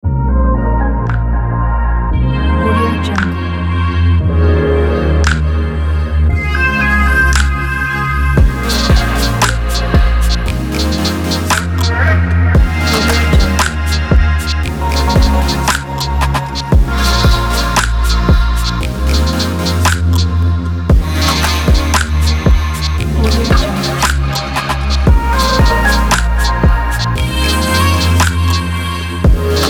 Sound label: future space